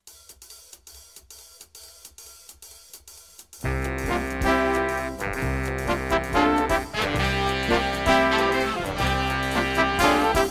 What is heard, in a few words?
Kicks and Sticks